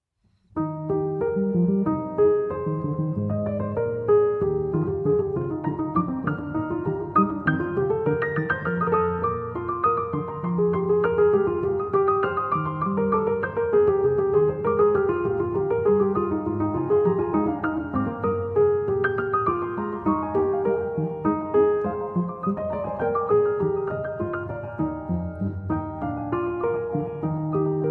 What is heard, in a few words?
Trio Sonata No. 1 in E-Flat Major; J.S. Bach